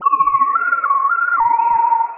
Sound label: Quasar Whistle 110BPM; sci-fi; Loops